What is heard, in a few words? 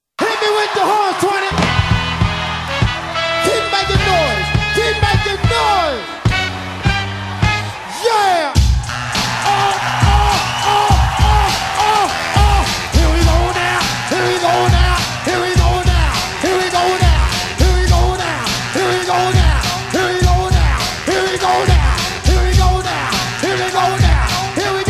Horns